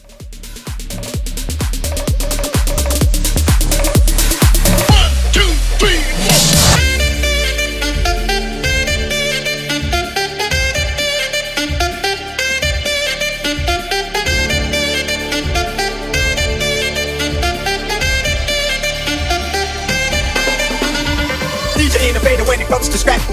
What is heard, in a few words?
Hybrid 128